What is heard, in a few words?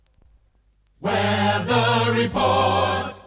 jinglepackage; topformat LP jinglepackage 2